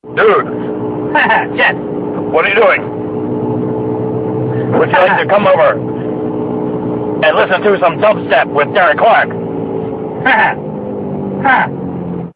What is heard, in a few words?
Dubstep